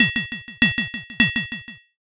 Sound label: blacksmith